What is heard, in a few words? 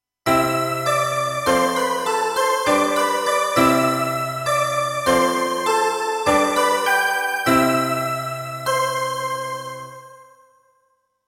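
diatonic melody 3 chord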